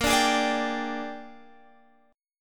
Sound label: Bb 13th, Guitar, Standard; Bb13 strummed